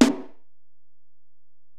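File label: Acoustic Snare, Alesis DM-PRO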